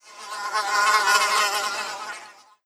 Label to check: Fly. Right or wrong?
Right